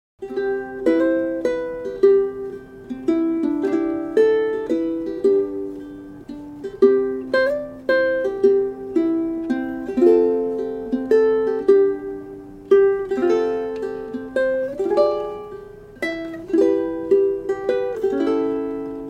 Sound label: carbon fibre ukulele; InnoTech